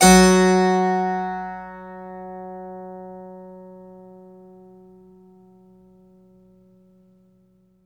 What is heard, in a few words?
F#4, Synclavier keys and guitars; Sampler Library